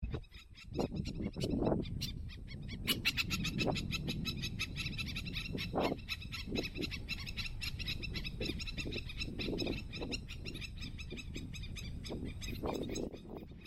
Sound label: black necked stilt